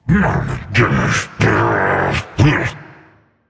grumble

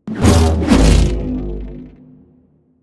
sword layer; smite; god arthur

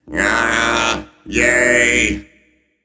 yay; smite; kuzenbo

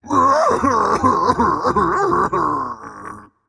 boohoo; smite; jorm

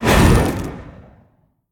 swing; Smite; Zhong Kui

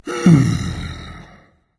content berserker; cuchulainn

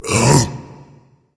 confused, berserker; cuchulainn

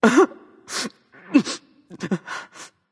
boo hoo; smite; arthur

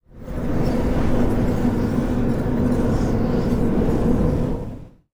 whirlpool ground; smite; poseidon

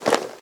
armor light; smite; chiron

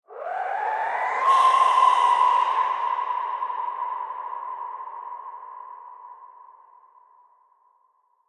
ambience bad death whistle; smite; conquest

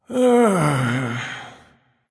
big sigh; chronos

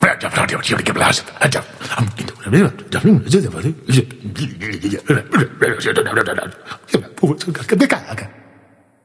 grumble; Smite; bacchus

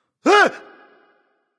surprise; smite; voicepack; khepri

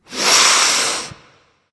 breath; smite; poseidon

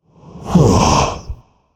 nv sigh; smite; voicepack; ganesha

